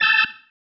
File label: Stab, Organ, One Shots